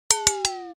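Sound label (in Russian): звук низкого заряда батареи на iphone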